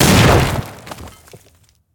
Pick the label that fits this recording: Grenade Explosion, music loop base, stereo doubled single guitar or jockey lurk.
Grenade Explosion